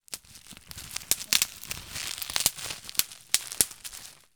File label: Footfalls breaking Twigs; footsteps